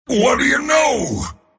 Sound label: last hit pain; mars